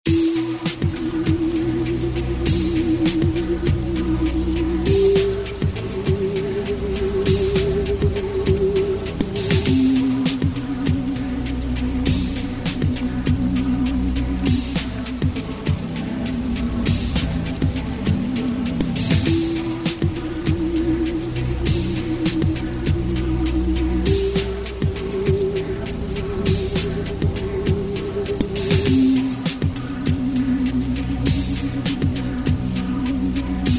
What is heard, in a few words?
beat